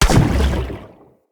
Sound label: Staff Caster Proj Destroy; Weapons; Staves; Dendrit Staff Caster